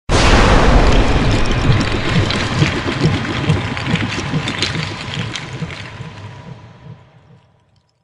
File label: bone armor